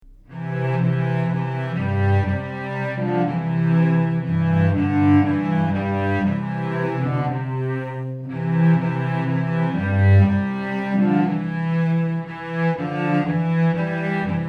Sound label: Cellos; Angels We Heard